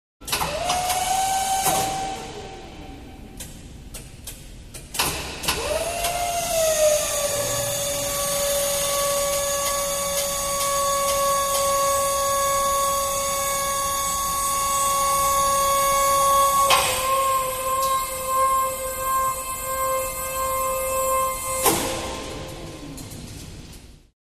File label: Elevator Servo Motor